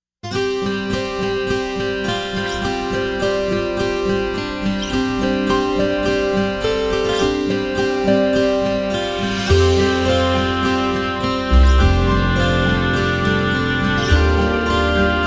with Oboe

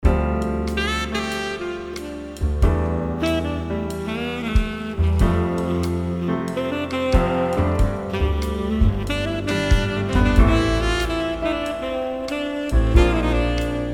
Sax

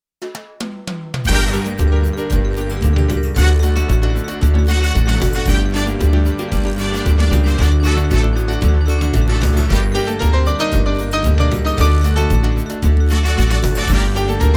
Merengue

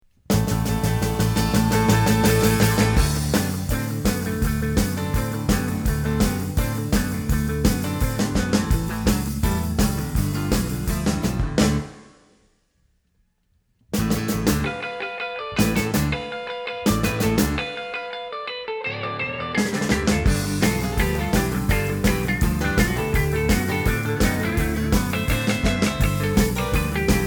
50s Rock

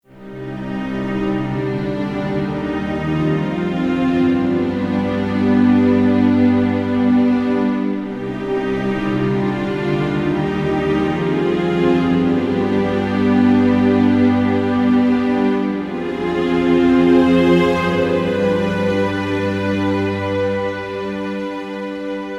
Strings; Marshall

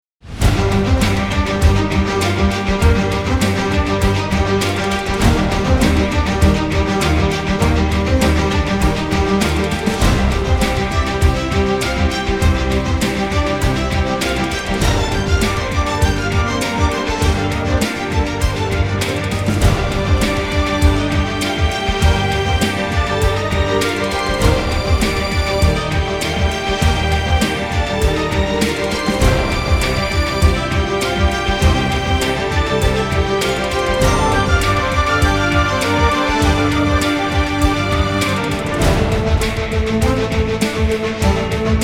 News Demo, NO MELODY; CBS

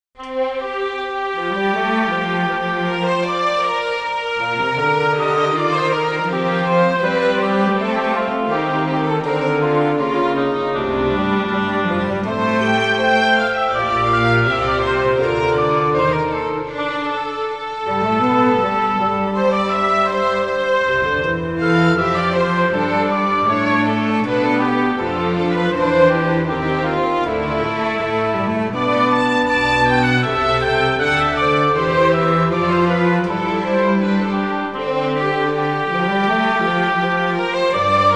Strings

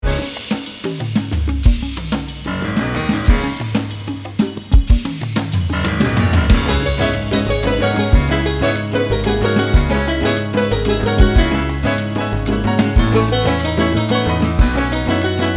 Quirky

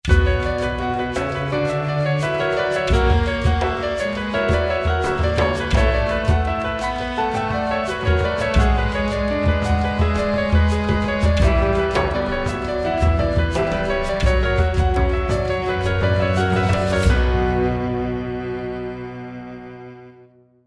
cello

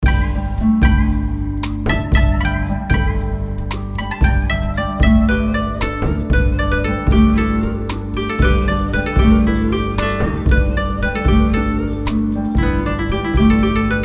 MUSIC BACKGROUND Theme Mix

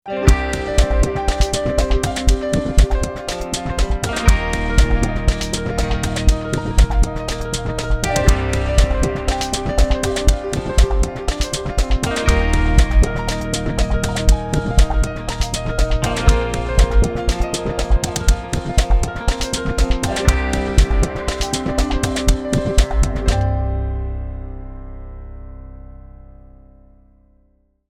Water Blues

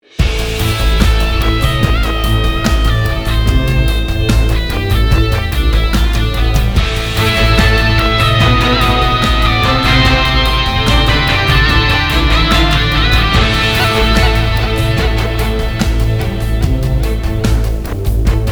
Music Open Orch version

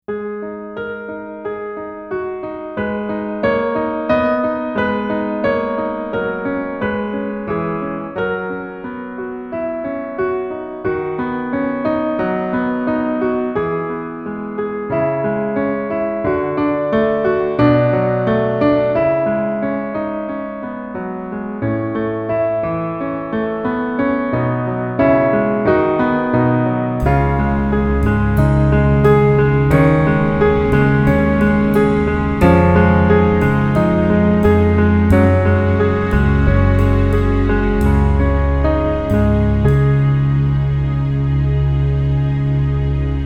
Music Cue Mix No Oboe

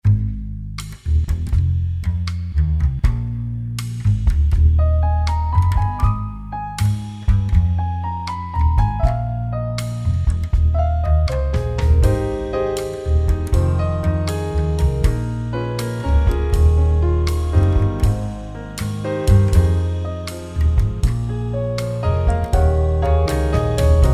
Extra Cue Jazz Standard 120 bpm

